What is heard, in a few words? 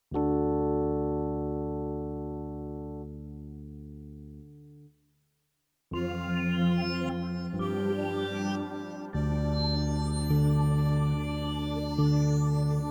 Interlude